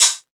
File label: hat wild, Open Hat; Drum One Shots; SD MIDNIGHT Sample Pack; Slate